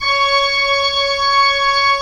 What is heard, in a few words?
Pipe Organ; E-MU Producer Series Vol. 2 – More Studio Essentials; Keyboards